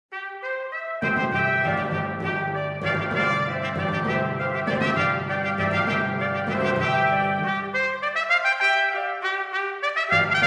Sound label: Trumpet Voices Vivace